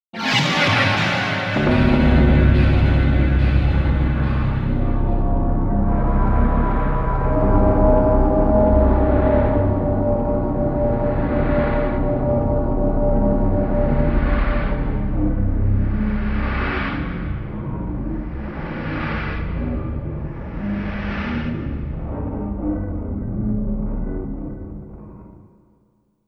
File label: Shock